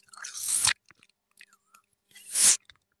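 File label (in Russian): zhidkost gustaya tyaguchee vsasyvanie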